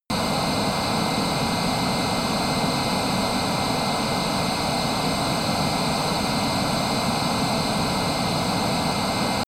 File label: military jet eurofighter typhoon turbine idle direct; flugzeuge jets; verkehr transport